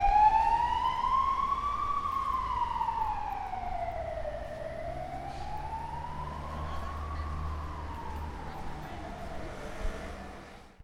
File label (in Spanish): Ambulancia